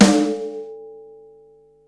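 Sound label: snare rock rim, Yamaha Motif ES drum machine; 300 Drum Machines; Yamaha Motif ES-Series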